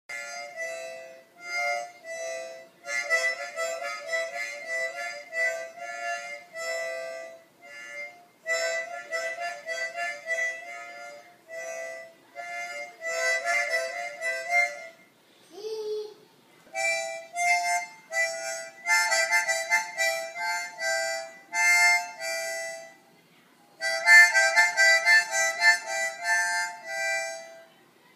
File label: harmonica